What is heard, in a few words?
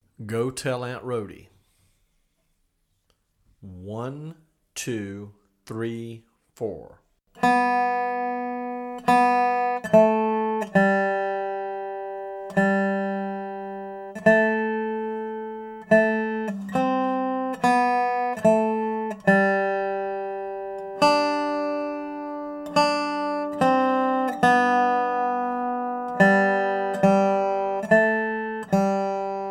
Go Tell Aunt Rody Slow